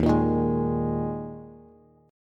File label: Eb Suspended 4th, Bass; Ebsus4 strummed; JGuitar